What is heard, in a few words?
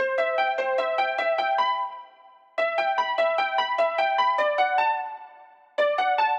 150 BPM E Min Piano; Stems; Cymatics; Space Party